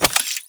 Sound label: Reload, Pistol; Grineer; Amphis; Weapons